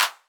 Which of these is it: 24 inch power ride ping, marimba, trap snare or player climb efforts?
trap snare